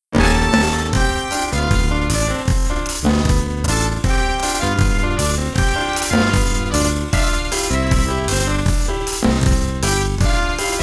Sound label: Jazz; speed 0.56; tsmtoolbox; wsola